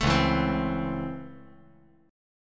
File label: Db Minor Major 7th Sharp 5th Db; DbmM7#5 strummed; JGuitar